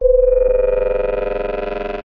sound design frequency modulation short whining transformation